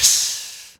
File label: Human Beatbox, Beatbox Hits; Vocal Hits; CYM; Loopmasters